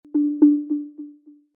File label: ios18 siri internet processing; Системные звуки и уведомления iOS 18 (iPhone 16, 16pro, iPad)